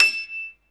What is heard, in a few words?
PNO E; Back In Time Records; Korg Universe VOL-1